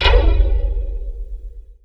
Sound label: antimater hit